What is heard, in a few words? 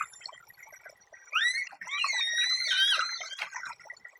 Constant chirp